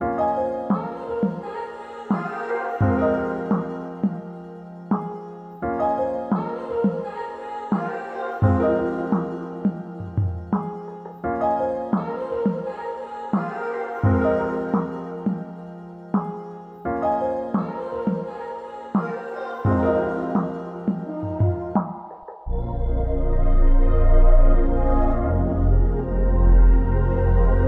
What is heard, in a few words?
171 BPM F Min, Melody Loops; Be Loyal; Cymatics; Orchid Sample Pack